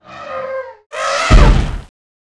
Mob die; Monster; 맹마

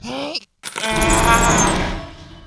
Mob die; Bossmob; 금전방; 소안금낭귀

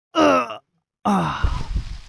Mob die; 금전방; 장궁수

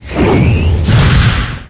fast jangpung

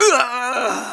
Die; Character

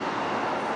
mob run, Monster; Bossmob; 금전방; 금적산

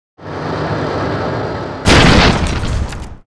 gate drop

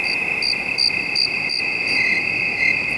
night bug; EnvSound

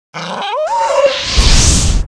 mob raid die; Monster; 레이드; 혈랑대왕

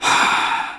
Breath

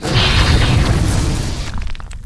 die; 패왕진묘수

